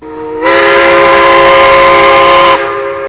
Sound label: chime